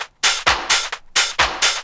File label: techno, DRUMLOOPS